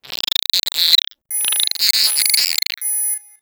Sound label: egg drown; player; Portal 2; eggbot